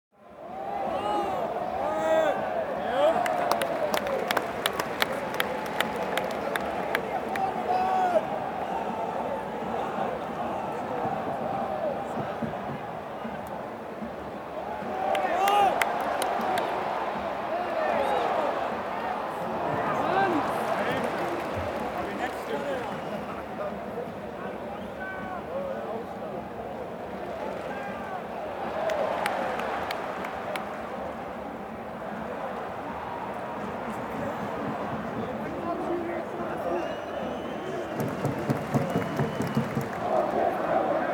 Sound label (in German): Stadion Atmosphaere